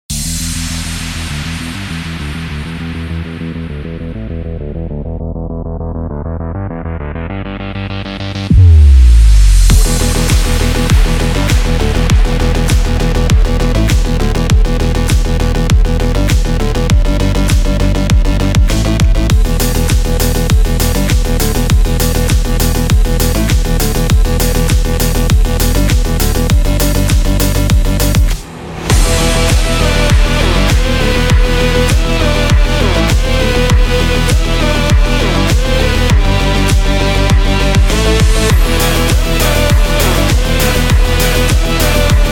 Sport Electro; Xtreme Club Racing

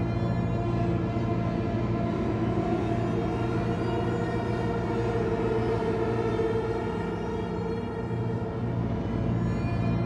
player hurt loop; Carrion

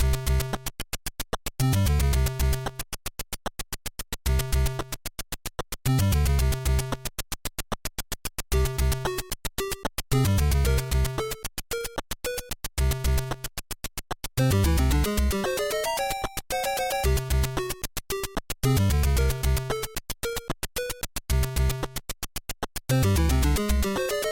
Area 5 Music; Extra Mario Bros.; Super Mario Bros. hack; NES

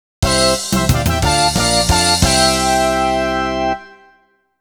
Level Up; epica stella

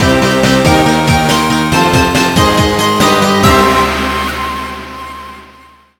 Final Boss Longer Fanfare; American Dragon Jake Long Attack of the Dark Dragon Prototype

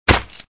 stab impact

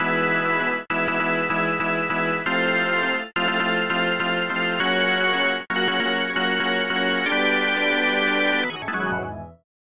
Organ Chant; Backyard Hockey; GBA

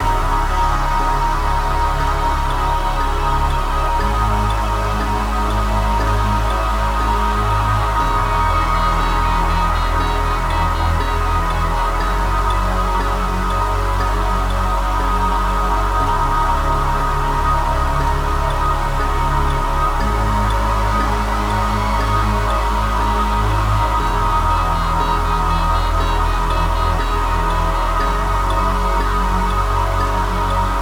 Music Moon Loop

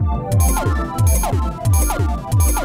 Electro; Moonlight Tower B

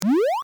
Penguin Take Fish

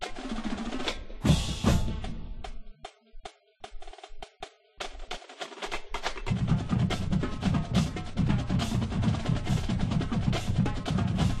Drums; NCAA GameBreaker 98; PS1

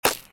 Waffle Break; Cookie Jam

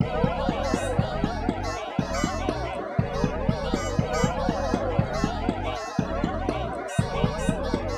Human

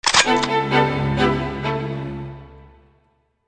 level up

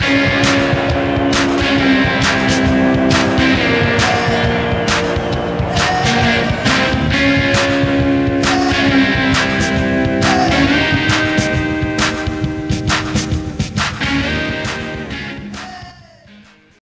Ditty; ESPN NFL Primetime 2002

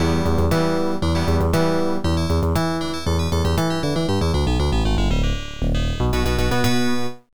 Unused Jingle; sky soldiers

